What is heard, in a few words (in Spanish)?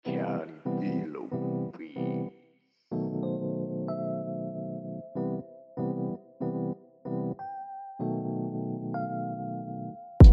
beat rap 94 bpm mi menor